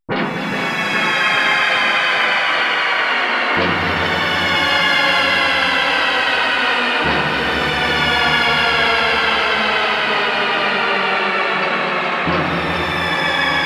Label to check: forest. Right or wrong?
Wrong